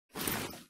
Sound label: open inventory